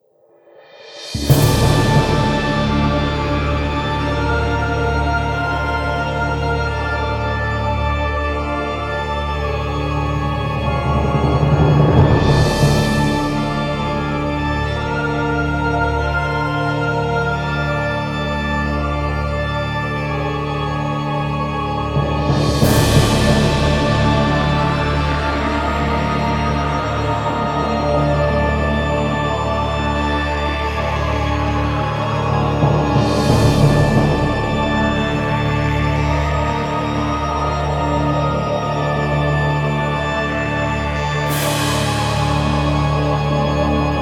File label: Harmonium; Mai-Otome